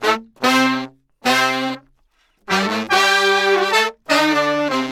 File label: saxes trombones trumpets